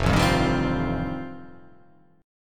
F 9th F, chord

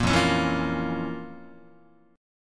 F Major 7th Suspended 4th Sharp 5th F, Guitar; FM7sus4#5 strummed; JGuitar

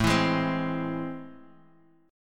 A Minor Major 7th A, chord

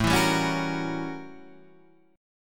A 13th Flat 9th A, guitar chord; A13-9 Chord {5 7 5 6 7 6} strummed

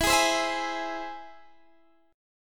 E Augmented, Guitar, Open D

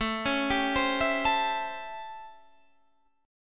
A 7th Sharp 9th A, Guitar Drop D; chord

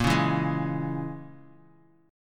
Bb Minor 7th Flat 5th, Bass Standard; 18,16,14,13; strummed; chord shape

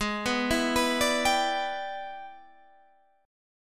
E 7th Sharp 9th G sharp

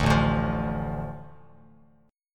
A Suspended 4th Sharp 5th, Bass Standard; Asus4#5 strummed; JGuitar

